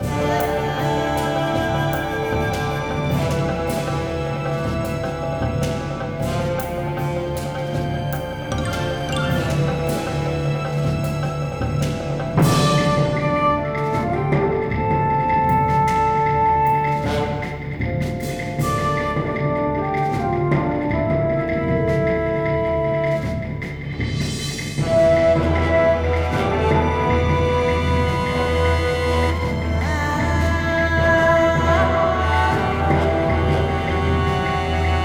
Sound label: Run Loop; adam s venture origins